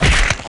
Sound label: melee hit